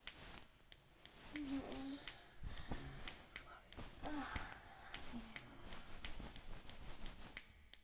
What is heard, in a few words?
screwing sounds; lucius